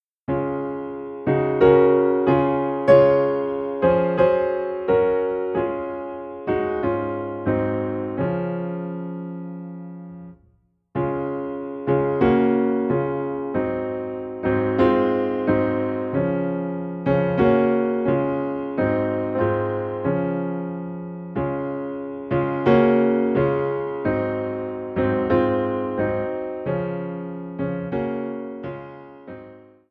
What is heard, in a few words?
Piano in D Flat